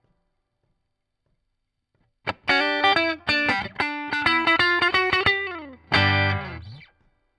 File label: Guit Solo, RNR for piano